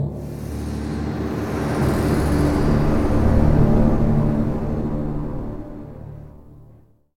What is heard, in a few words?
stinger; Combat Task Force 121; Windows, Xbox